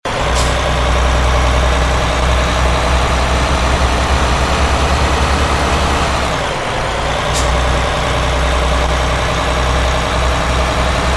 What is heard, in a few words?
third, bus; TDMCarpack; TDMCars